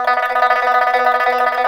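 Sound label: PIPA Tremolo B4; Asia; CHINESE SET; Spectrasonics; Heart of Asia CD1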